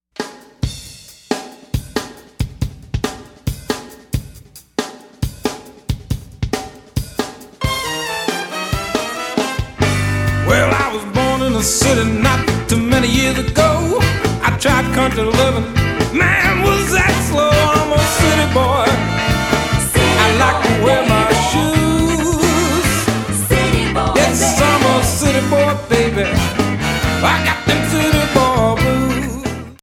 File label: Blues Alternate Take